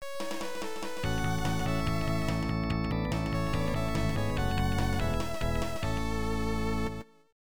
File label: electone; Little Neptune; Great Sluggers 94 New World Stadium; namco nb 1; arcade